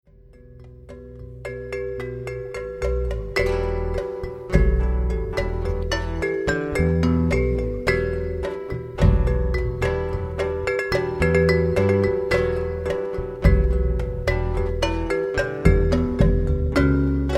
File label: Bossa